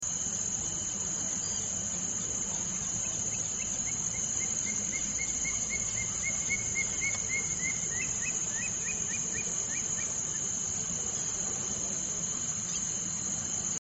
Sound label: Indian Thick knee